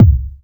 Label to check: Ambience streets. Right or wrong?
Wrong